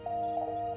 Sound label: Door Chime; DS9; trek